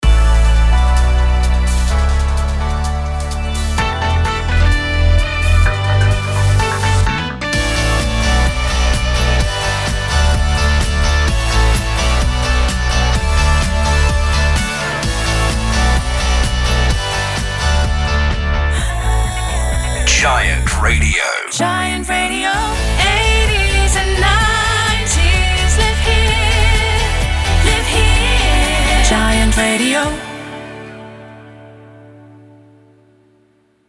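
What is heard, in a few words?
Sung Jingle 128bpm D major; Giant Radio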